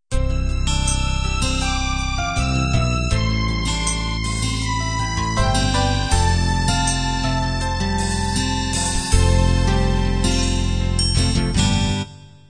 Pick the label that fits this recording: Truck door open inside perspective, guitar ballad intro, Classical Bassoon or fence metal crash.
guitar ballad intro